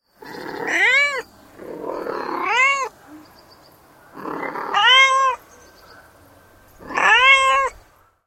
Cat meowing sound

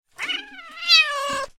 Angry cat sound

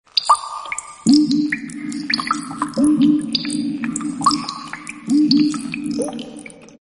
A Beautiful water Drop sound

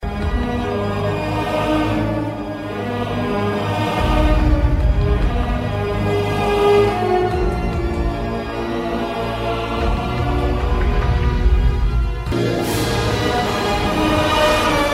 Scary classic

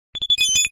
cyberpunk text msg tone

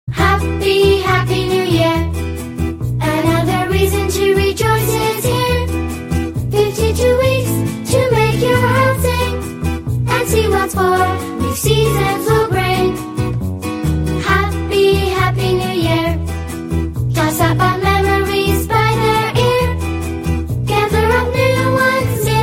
Happy Happy New Year English Song

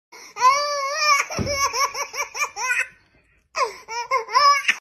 so cute baby laughing smile

